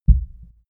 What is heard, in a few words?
heart beat single low